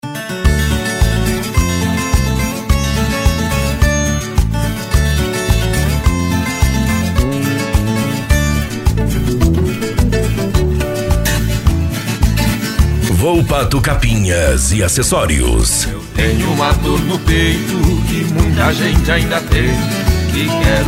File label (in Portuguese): pagode de viola